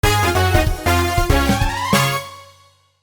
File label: Item Minigame (Good Item); Mario Party Superstars